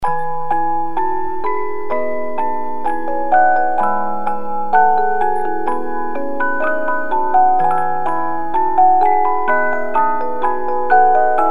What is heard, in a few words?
50-Note Movements, music box; 9th Symphony